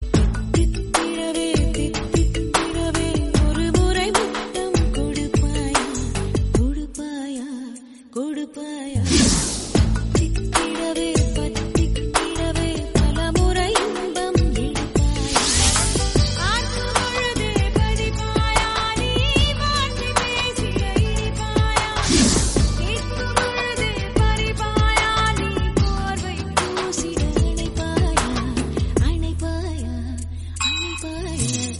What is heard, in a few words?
Tamil Song Ringtone; Jana